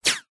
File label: bullet pass by; zapsplat